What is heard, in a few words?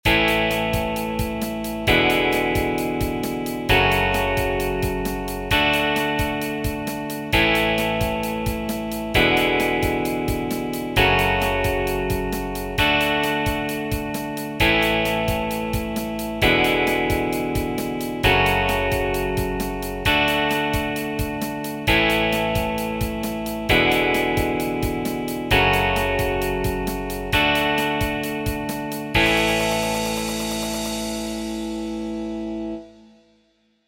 Fast Rock No Keys; Tryout track; 2027 MMEA conference All-State Collective Ensemble audition